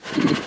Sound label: boop